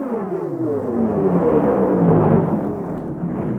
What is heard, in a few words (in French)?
LOOP Frotte Grogne Mono